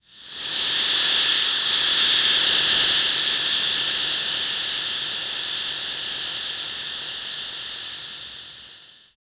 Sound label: champagne sparkles sound; food and drink